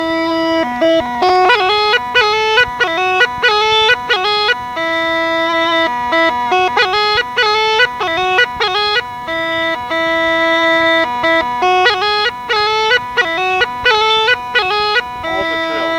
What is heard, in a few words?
song in 2 4, Rodope Gajada